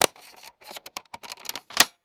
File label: plastic tape dispenser loading clicks and snaps; foley